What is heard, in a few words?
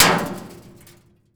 bullet metal barrel; physics